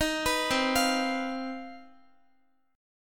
C Minor Major 11th C down Guitar 4F 4A 5C 5G 5C 6E; CmM11 arpeggiated; JGuitar